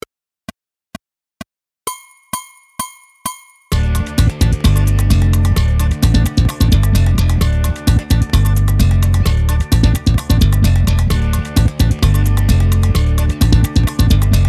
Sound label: samba funk groove loop